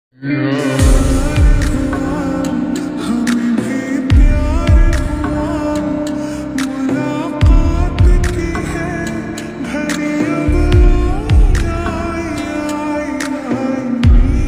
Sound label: lofi remix hindi ringtone